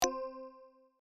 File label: chime